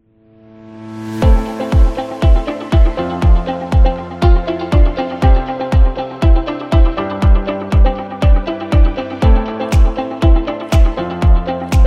Corporate Meeting

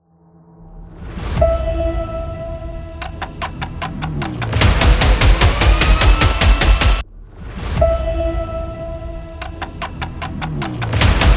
Epic Intro

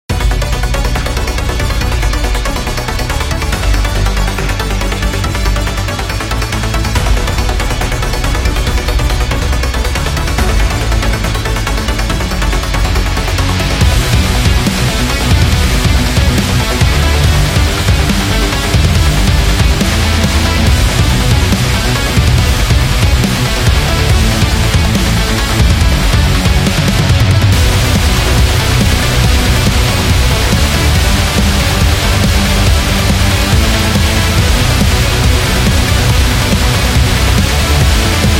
Dark Rock; gym rock

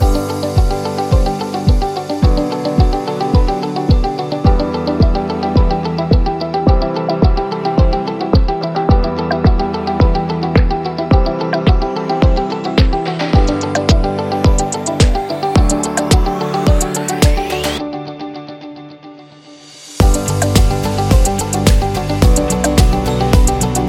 Real Estate Luxury; technology

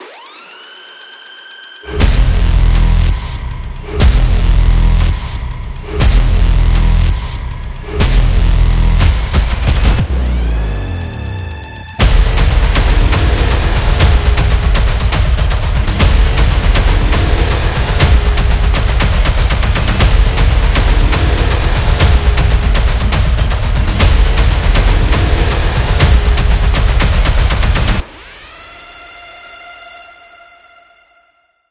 Epic Opener; epic drum

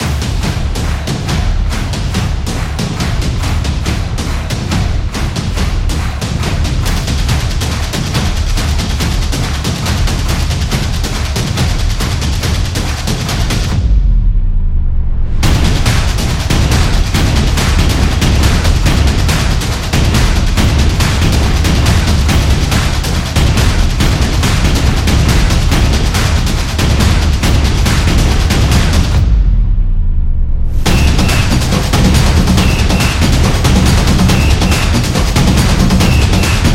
Oktoberfest, sport drums

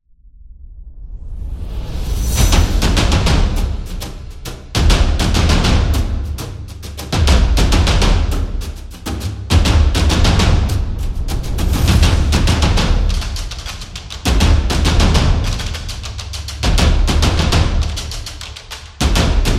The Tribal Drums Trailer; tribal dance

